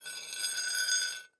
Pipe Drag Slide; MinorHallutinationsSounds1

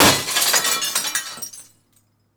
Window Smash Break; MinorHallutinationsSounds; SFX Producer

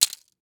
Pill Packet Sheet; Pills

